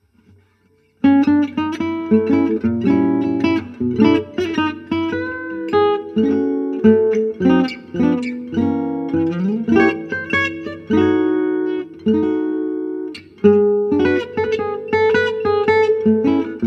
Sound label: IR optima bumgarner